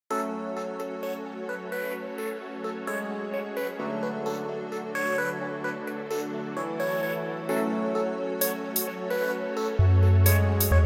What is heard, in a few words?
girl beat estilo brasilia instrumental